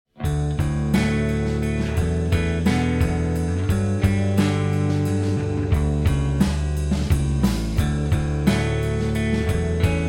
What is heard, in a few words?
American Metal